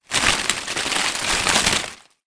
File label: tree branch leaves movement; foley; zapsplat